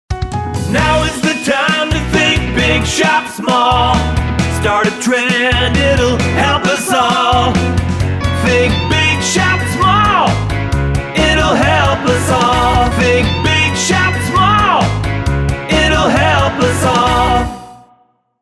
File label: jingle